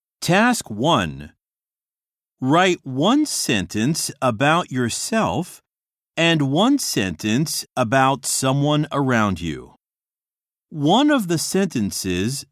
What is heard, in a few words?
harmony; 新課程英語教科書 指導用音声CD